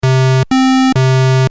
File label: pulse; nord3; 矩形波